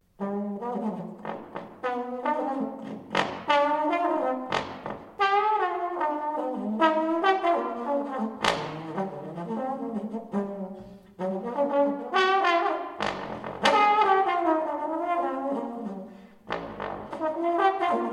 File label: bebop tbn solo